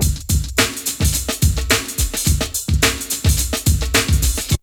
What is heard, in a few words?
break; Zero G - Man Machine